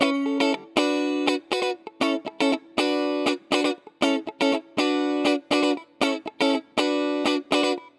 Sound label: Guitar, 120bpm D, Nu Disco; Zero-G; Happy People